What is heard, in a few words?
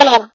Football head poke recover Sfx